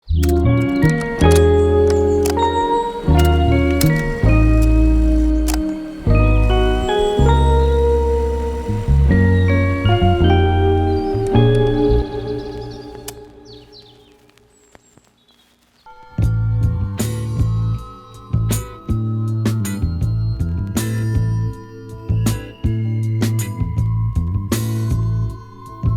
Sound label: Orchestral French Funk